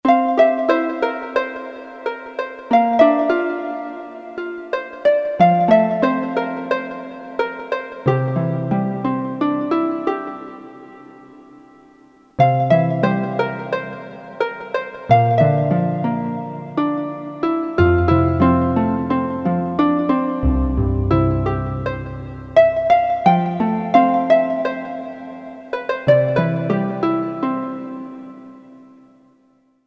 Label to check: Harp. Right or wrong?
Right